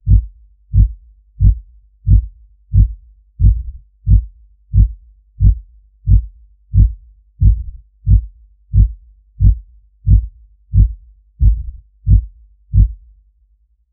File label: intro soundtrack for an a, advertising